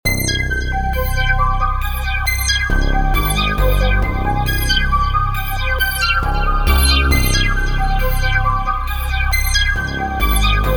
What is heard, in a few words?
drip, trap; 2 8 expansion trap pack bundle purple edition